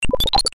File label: Bleep